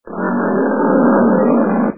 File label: lion sounds